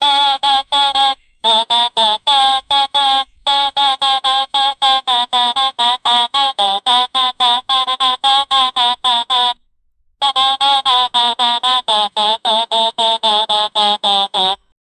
choir sings funny frog

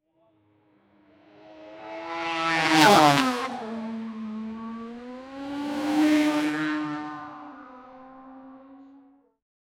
nascar next gen cars; motorsports

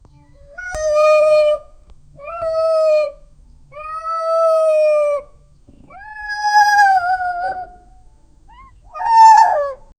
monkey zoo sound

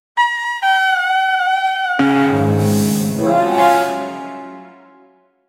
jazz reveal sound

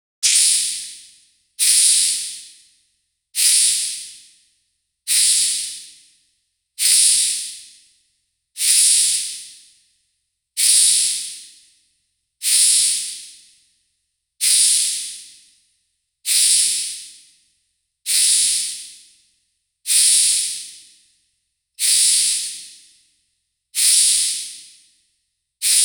combined snake slither an

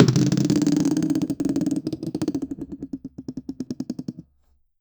fairly muffled, children

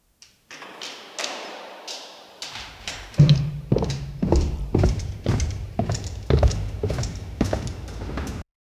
effect hurried steps open

